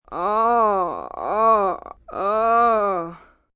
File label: Moan Teen, Moan, groan, child, boy; Groaning Sound Effects